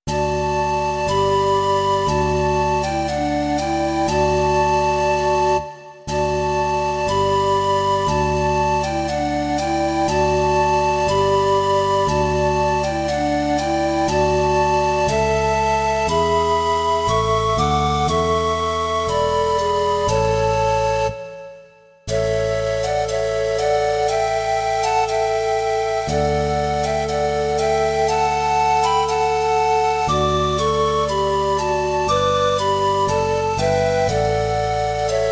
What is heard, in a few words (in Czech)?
trio Panových fléten a baskytara; Ó ty radostný čase vánoční; Imaginativní verze